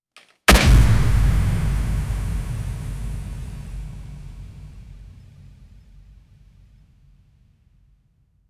golpe fuerte al estilo de, fighting game

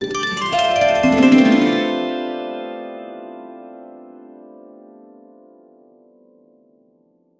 estilo arpa romntica, harp

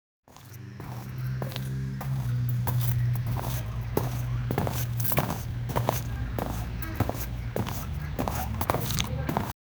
juego pixel art arcade

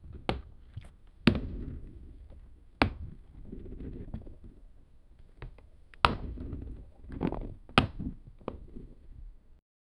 saltos en un inflable, playground

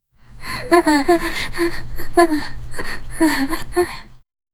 chica jadeando, breathing

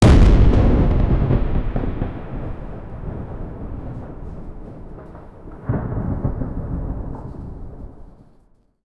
algo como truenos el eco, castle

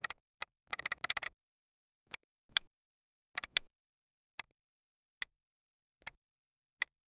oprimir un botn y sonidos, robotics

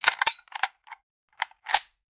recarga m4a1 estilo video, fps

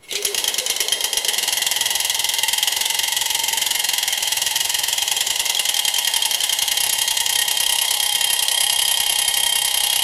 maquina del tiempo antigu, steampunk

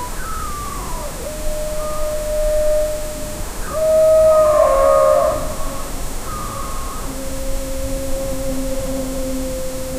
el cuervo surca los cielo, crow

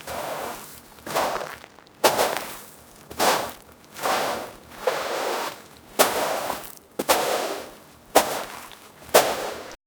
paso nico en arena arrast, sand

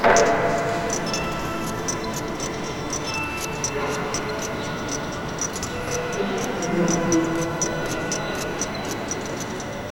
sirenas electrnicas; laboratory

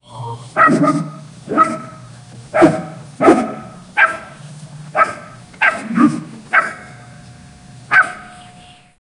un hombre transformndose, transformation

